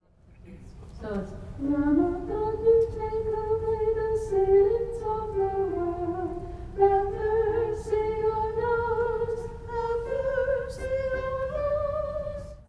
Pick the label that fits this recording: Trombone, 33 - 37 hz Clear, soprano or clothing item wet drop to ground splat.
soprano